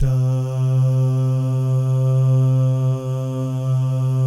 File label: DAH, jazz FX, mix guy gal; Spectrasonics; Vocal Planet